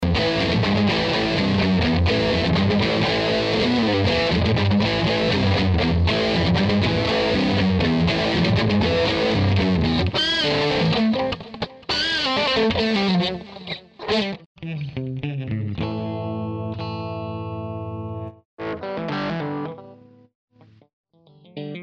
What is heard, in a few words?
guitar; Boss Micro BR 超小吉他手四轨录音机; 使用Micro BR内置的效果器录制的吉他